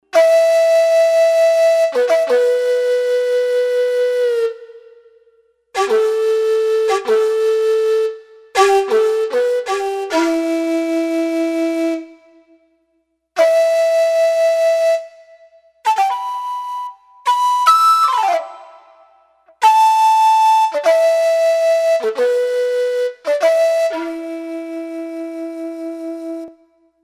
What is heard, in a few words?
Shakuhachi